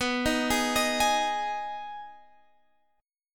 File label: D sharp Suspended 4th Sharp 5th B